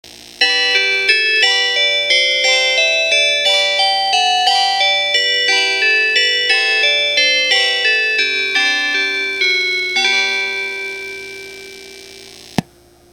kiha40 chime; higashinihon

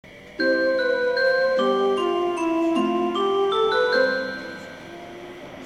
tx2000 chime; tsukubaexpress

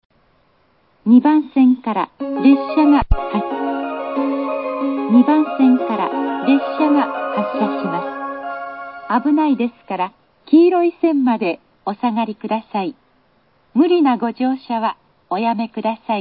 matsumoto track starting bell, eki; track 2; shinano 21go; higashinihon

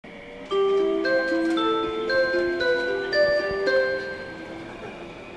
tx2000 chime; tsukubaexpress

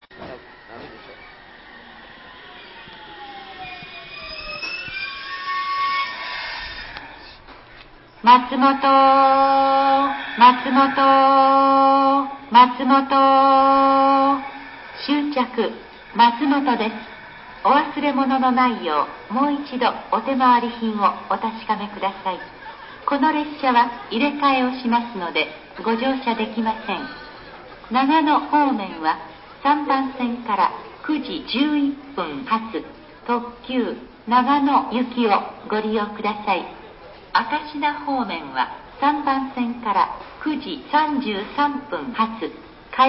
track arrival; matsumoto; higashinihon

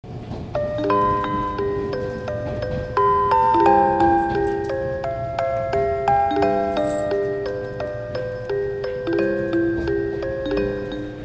nishitetsu chime

